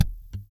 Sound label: VALLEY FUNK, Bass; Spectrasonics; Bass Legends